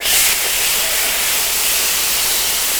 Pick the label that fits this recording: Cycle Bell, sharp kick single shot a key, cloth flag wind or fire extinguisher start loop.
fire extinguisher start loop